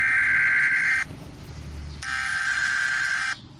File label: siren; siren head